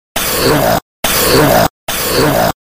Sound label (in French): moustique gros